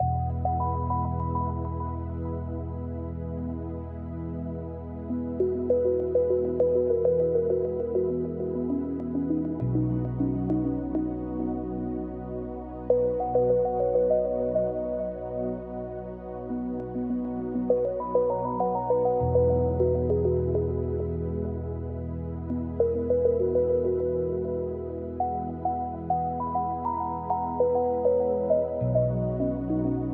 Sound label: music game loop